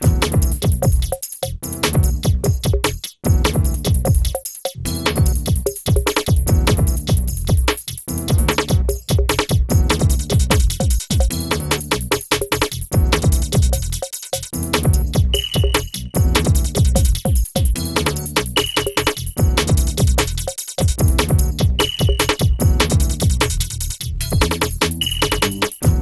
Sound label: BEAT, Beats 105-150bpm